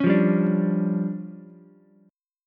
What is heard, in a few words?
D Minor Major 7th Double Flat 5th, D, Ukulele; DmM7bb5; strummed; JGuitar